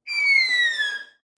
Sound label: Violin Seagull Effect Fast